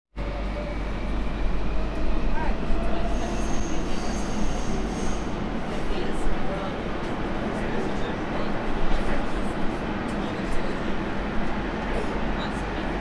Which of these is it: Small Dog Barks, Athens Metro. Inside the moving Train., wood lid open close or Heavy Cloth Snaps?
Athens Metro. Inside the moving Train.